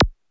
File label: Electro Samples; Central Processing Unit